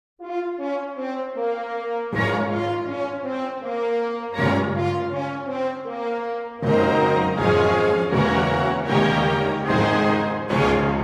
Piano Concerto in B-Flat Minor, Allegro Non Troppo e Molto Maestoso; Piano Concerto No. 1 in B-Flat Minor, Op. 23; (Opening)